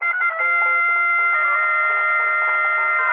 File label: keys, phonky loops